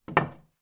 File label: foley breeze block put on top of other breeze block